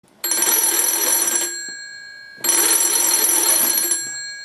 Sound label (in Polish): Stary telefon stacjonarny